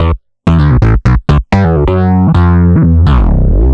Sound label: Bass 128 E2, analogue circuit; musicradar